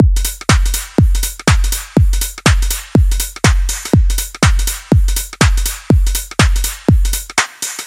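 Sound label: pure analog deep house loop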